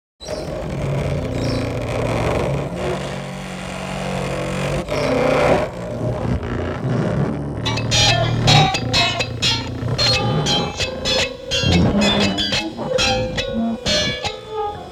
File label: The Well Strung Ironingboard Played With A Bow In One Hand And A Guitar; Guitar Pickup